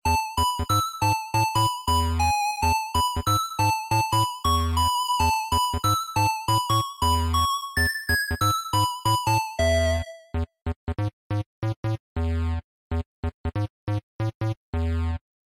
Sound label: Music Shop; Dungeon League